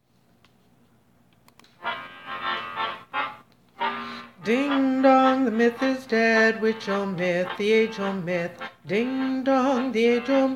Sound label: ding dong, chorus